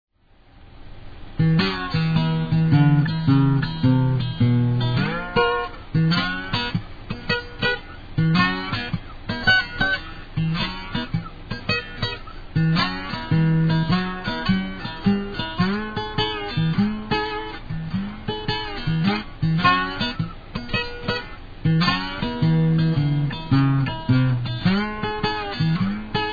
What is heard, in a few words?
Acoustic Guitar